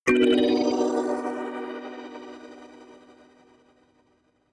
notification kalimba magical minor low

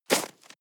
step snow walk single

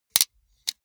sunglasses metal side piece fold

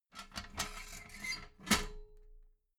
metal ladder small handle fold soft

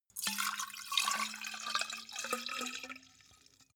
carafe refill

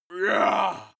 male shout hit

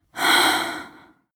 exhale female

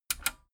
metal button radio click disable fast

